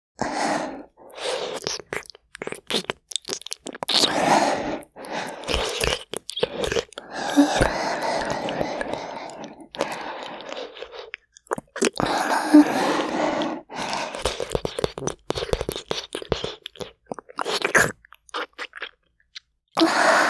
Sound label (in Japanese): 攻めキス